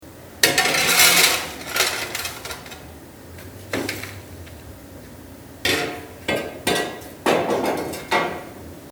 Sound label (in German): In der Garage